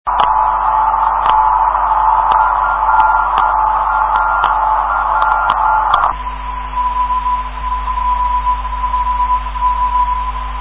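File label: 356 kHz 400 24 kHz filter, non-directional beacon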